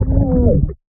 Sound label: underwater scream male dark; soundscrate